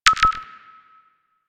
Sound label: Objective Beeps; DE; Warframe